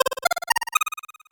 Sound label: 8bit se